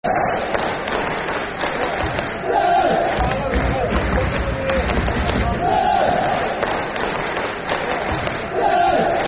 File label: City (Clap) Football Chants (Soccer Songs), Cardiff City; fanchants-com